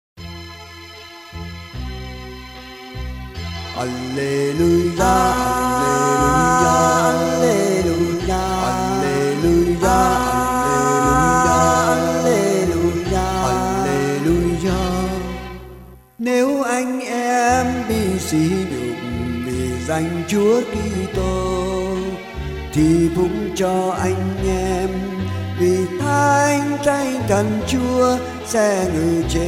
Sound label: Alleluia song; cac thanh tu dao viet nam